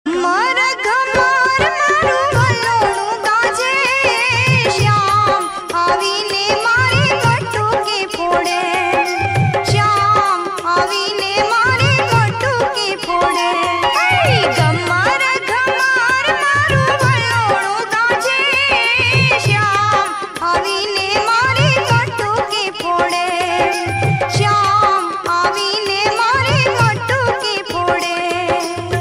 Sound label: gujarati ringtone